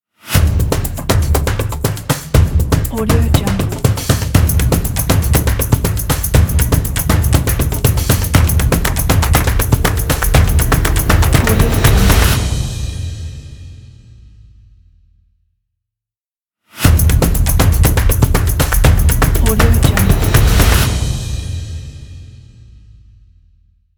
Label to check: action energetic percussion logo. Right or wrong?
Right